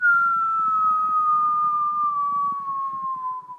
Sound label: cartoon whistle